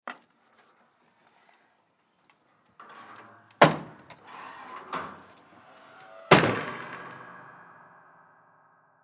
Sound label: Attic Door Pull Down Close; Miscellaneous Doors; Household; Blastwave